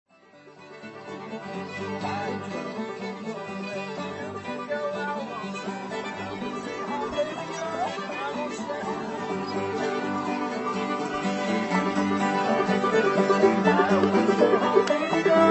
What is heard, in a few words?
Fiddle, Banjo, Guitar, Key of G; Hakone Bluegrass Festival; Big Scioty; Old-Time JAM